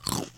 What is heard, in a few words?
chomp; MexicanaChillitime; ClubFusion